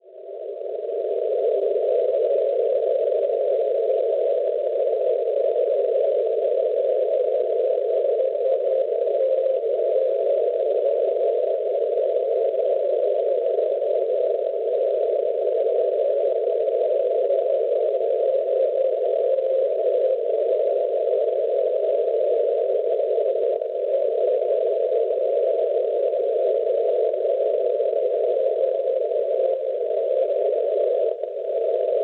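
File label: NDB non-directional beacon, 293 kHz; STE; Steinhof; Austria; Schwarzsee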